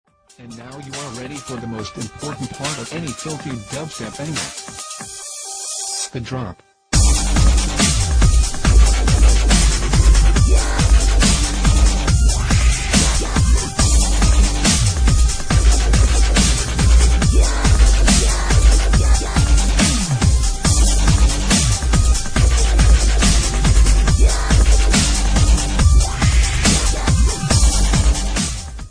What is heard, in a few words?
dubstep ringtone; ukf dubstep tutorial